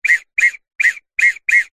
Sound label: whistle blow weak broken mostly breath noise x5 toots; foley